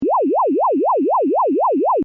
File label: Retro SFX loop, Retro Style Loop, Generic Game Style; Tech; Stuart Duffield